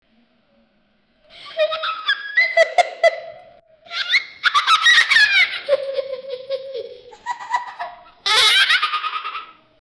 running footsteps

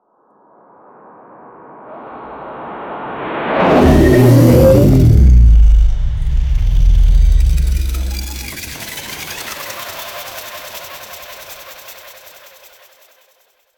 sound efects impact trans, impacts

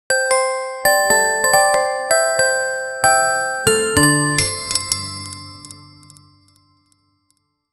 slots game reward win sound

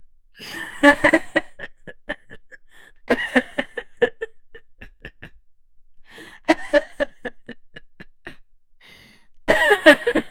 a woman chuckling softly, amusement

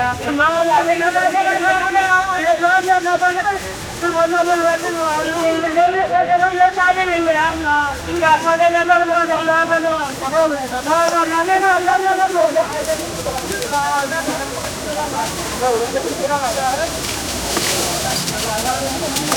fish market in bengaluru; india

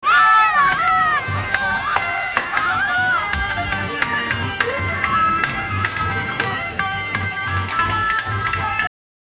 festival celebrations fam, festival

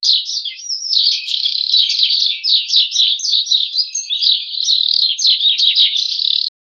birds chirping; environmental sounds

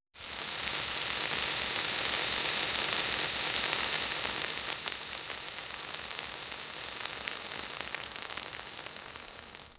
realistic cinematic sound, beverages

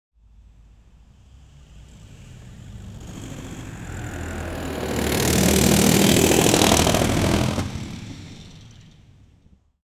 rickshaw out of control, vehicles

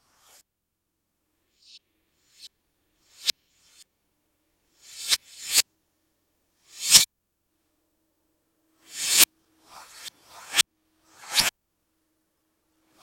the effect of the reversa, cards

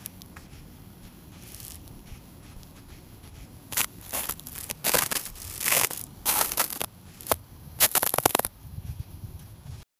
crisp crunching of ice

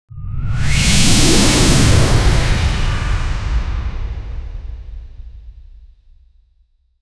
cinematic superhero eye, superhero

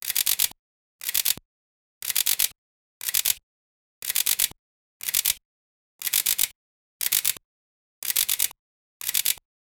professional dslr camera, photography

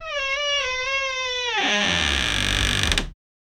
an old door creaking

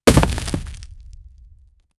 quick tree fall sound fas, wood crack

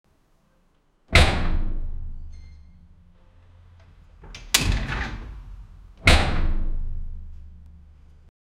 the sound of a metal door crashing shut

